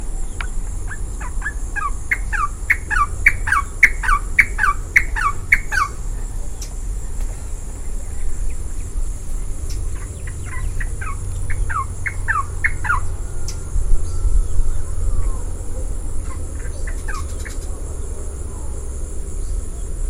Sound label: rufous naped wren; costa rica 2013